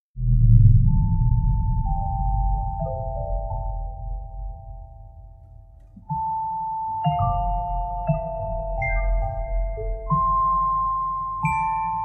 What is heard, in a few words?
Percussion